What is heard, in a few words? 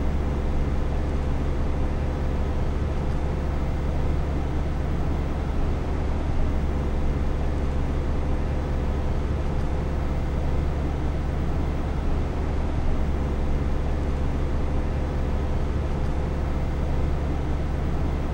Truck Moving Normal